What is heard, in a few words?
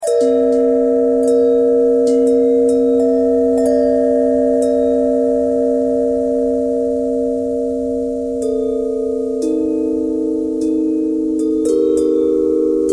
bell thread